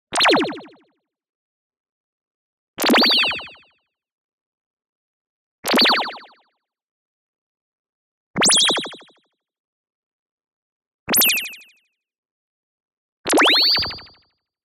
TONAL Two Oscillator Frequency Modulation Bubbly Short; boom library; Anime Essentials